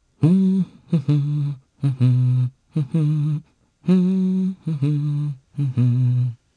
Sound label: Vox Hum, jp; heroes; Theo; kingsraid